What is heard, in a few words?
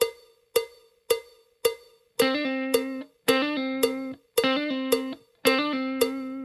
blues tricks full, guitar